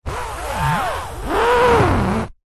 household canvas frame picture large fingernails rub scrape weird tone; zapsplat